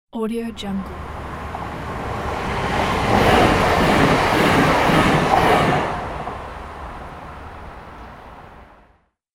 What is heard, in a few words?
express train fast pass by; urban sounds